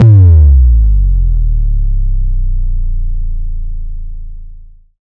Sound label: Bass Drop; SoundsCrate